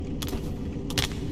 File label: dodge light; Dark Souls III